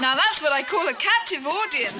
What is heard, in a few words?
Captive Audience; Joy: "Now that's what I call a captive audience!!"; Bugaloos